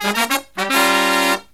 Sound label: Killer Brass Riffs, 108 soul